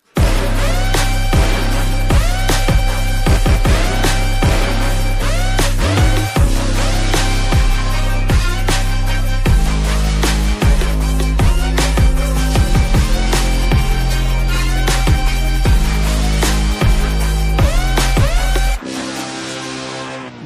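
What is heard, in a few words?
song cover background music ringtone; kalki ad 2898 movie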